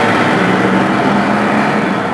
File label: car engine; Max Payne; tenements